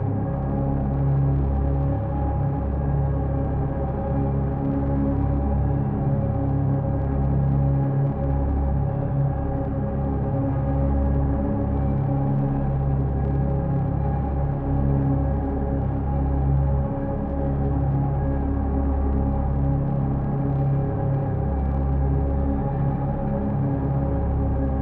psi ship battleship idle; ships; Stellaris; shroud

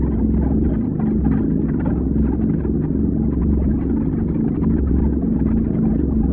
probe idle; Stellaris; other entities

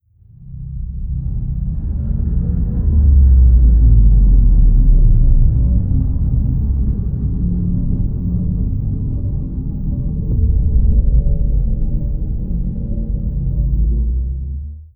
fallen empire colossus opening; apocalypse

